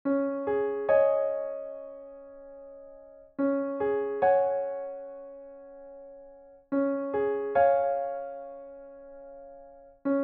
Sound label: piano